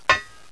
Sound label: footstep ladder